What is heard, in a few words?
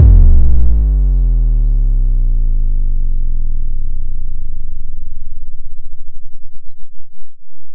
kick